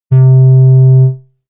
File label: Solar-Flute A